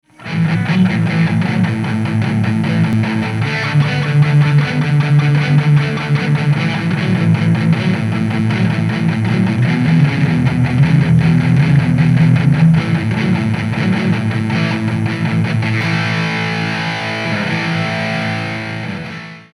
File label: drive rev